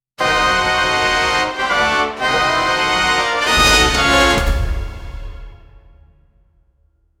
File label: maximum energy jackpot, circus